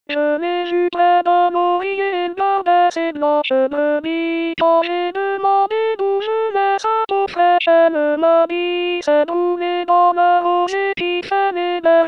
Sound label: zai zai Alto